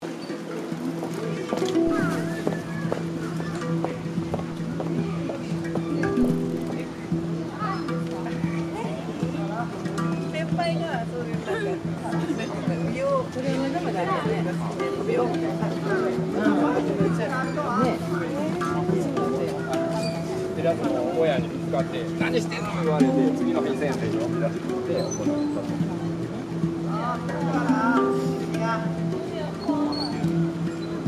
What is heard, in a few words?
Kyoto music street; Sounds of Kyoto